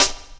SD, Drum & Bass, 140 BPM; Ueberschall; Drum & Bass Resonance

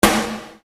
Snare Dr

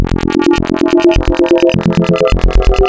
Spectral, wavetables; Architecture 32

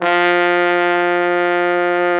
TROMBO; Trombone

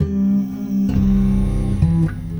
Guitar And Synth

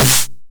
909 SD Tape Sat; Goldbaby Tape808 & Tape909; The Tape 909

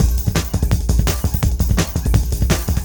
CYCLONE KIT, PROCESSED ACOUSTIC DRUM KIT; Sonic Foundry (Sony Creative Software); Vir Unis Infusion

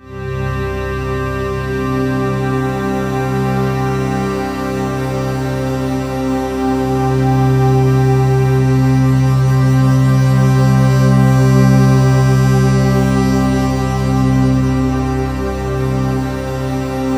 CELTIC, SYNTH SND, Ominous Drones; USB Soundscan vol.48; AKAI

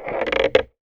Marble, household rhythm samples Hits

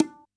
DJEMBE, Yamaha DD-65; PERC

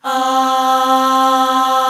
POP AHHS

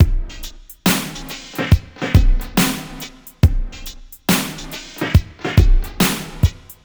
SloMo Beats, Loops 140 BPM

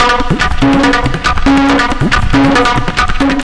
Tabla, 140 BPM; Zero-G; Pure Tabla

